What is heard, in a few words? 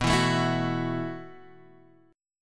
B 7th Suspended 4th B